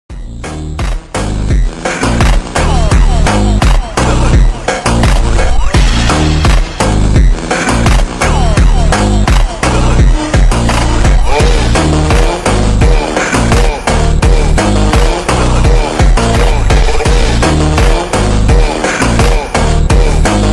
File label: slowed reverb